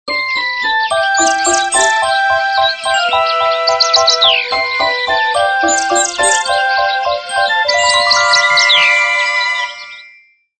Morning Bird, ringtones

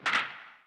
Sound Lock; Pantech Discover P9090

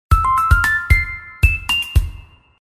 custom notification; Explay; Atom

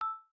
Dial Calm; Samsung; Galaxy A52s

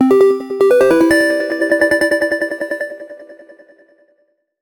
Speedwell, ringtones, HTC One M9

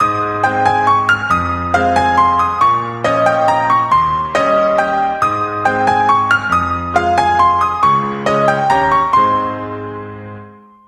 music piano; Samsung GT-i7680; cmcc

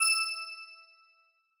new particle unlock, phone tones; LG K10 T-Mobile

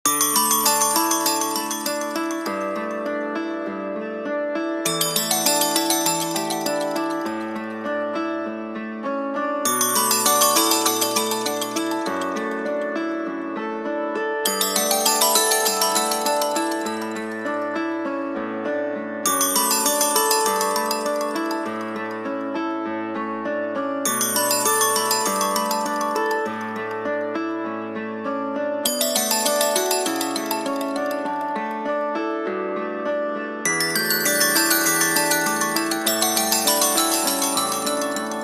Off Alarm; SKY IM-U310K; System Sounds

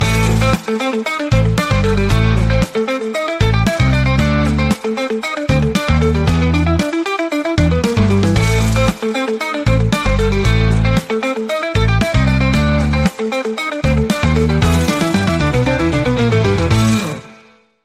Stimulation, ringtones

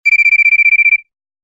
Cricket; LG; AX840-Tritan

